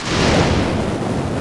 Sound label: thunder